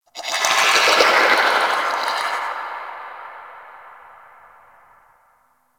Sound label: Misc One Off, Ambience; Warframe; Tenno